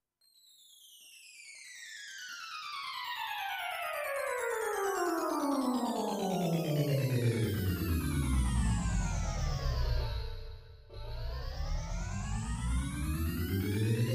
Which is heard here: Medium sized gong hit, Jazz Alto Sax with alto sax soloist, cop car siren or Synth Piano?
Synth Piano